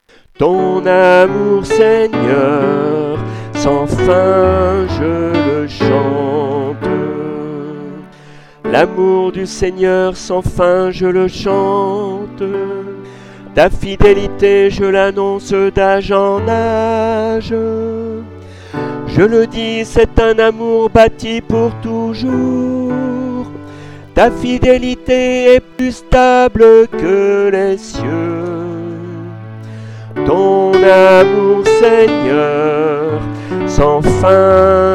Psaume Chant Et Synthe

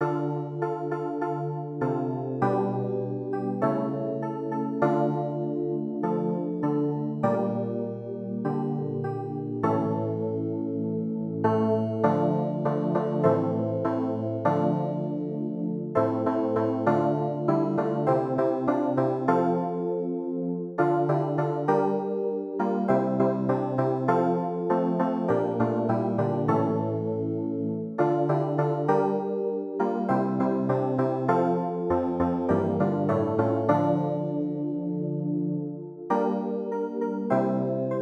CLAVIER

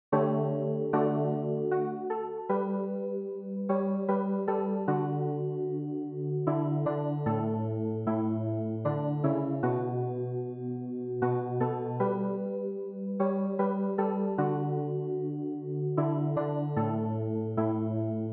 CLAVIER

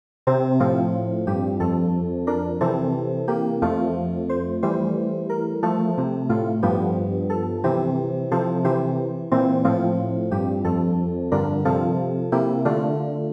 CLAVIER; Kantik Sant Yan

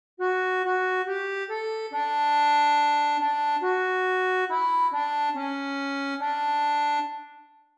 ACCORDEON; Psaume88

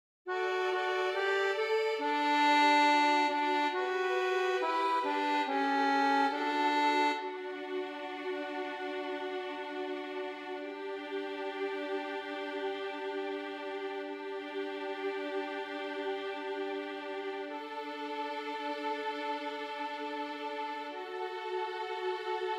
Psaume VIOLON

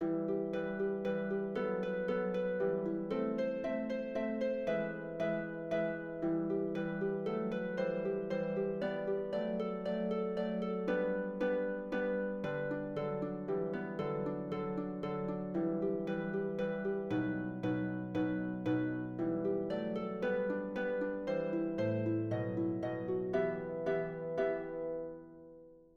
HARPE; ALLELUIA de Schutz

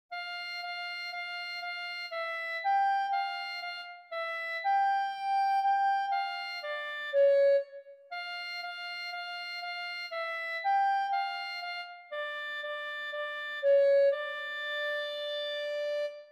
CLARINETTE

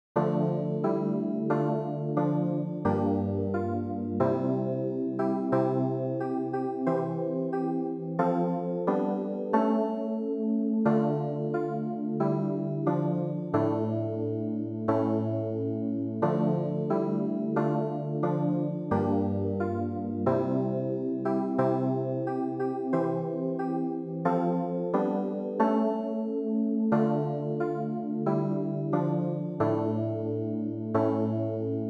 CLAVIER; AGNUS St Jean

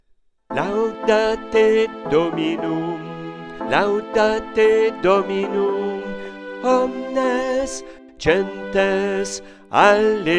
BASSE; Laudate Dominum

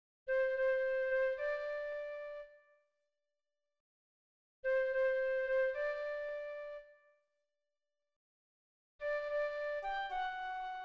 FLUTE

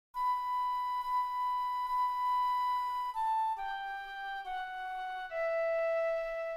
FLUTE

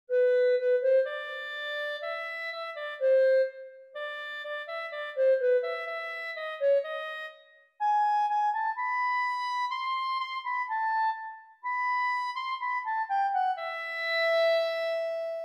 CLARINETTE

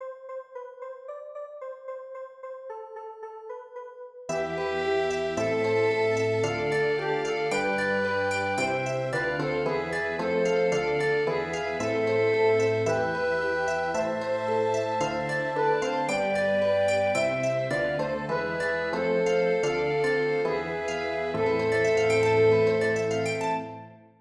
ORCHESTRE; Doxologie Solennelle

